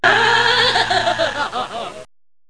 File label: Ouahaha; Mobiclic46